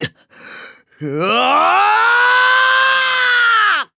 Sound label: Yell; Siegfried